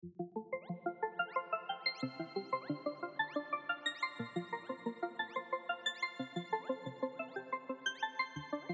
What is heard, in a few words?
Medley Solo; kompa